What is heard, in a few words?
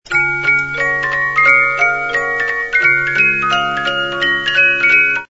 March of the Wooden Soldiers, 72 Note music box